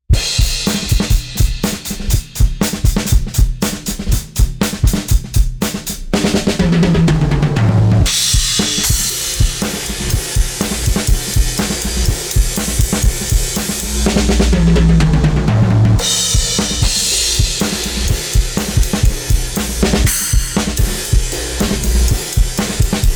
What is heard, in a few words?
drum buss